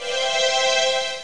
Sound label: key; Museum of Anything Goes